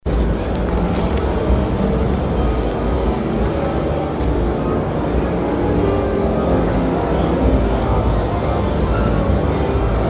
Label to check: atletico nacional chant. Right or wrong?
Wrong